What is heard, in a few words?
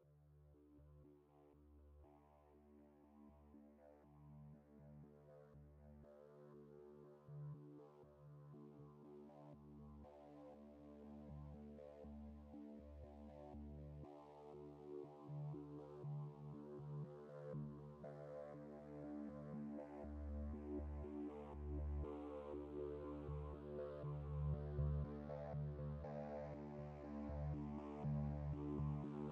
fat pad ambient space engine